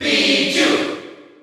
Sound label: Cheer Dutch; Pichu; SSBU